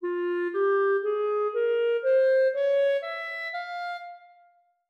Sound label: F min scale 3 notes